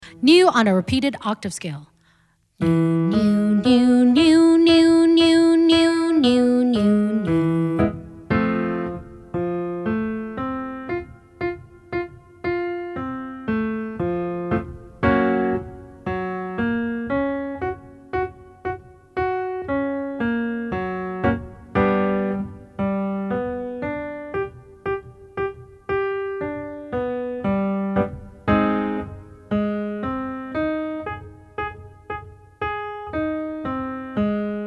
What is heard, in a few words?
nu repeated octaves middle range, vocal exercises